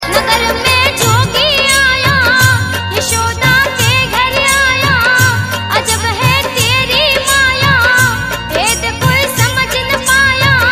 Nagar Me Jogi Aaya (Female Version), Devotional Ringtones; Shiv - Bholenath